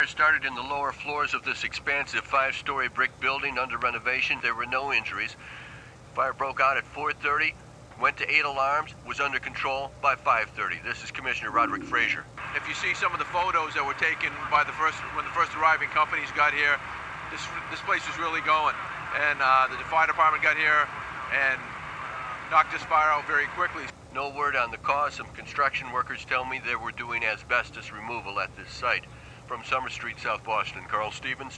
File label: 8 alarm fire; south boston